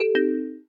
Low Battery; Sharp; FX Plus

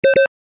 roulette; LG GD900 Crystal; Game Sound

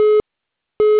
call wait, phone tone; Samsung SCH-M710 T Omnia II

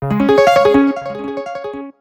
Alert 4 (Harp); Sony Ericsson; PlusFourSix; Josephine (G700); Lars Edvall; Josalita

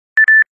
Beep twice; Nokia N95

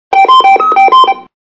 Basic bell; Samsung; SCH-i830

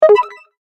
New SMS, notifications; Asus Zenfone-10

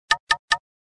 Knock; GS500 Cookie Plus

